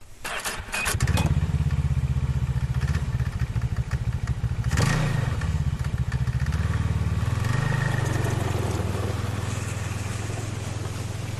Snowmobile Ski-doo engine starts engine howls drives away ignition; your free sounds